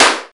crispy acoustic snare b key, steel snare drum sound tuned to the B note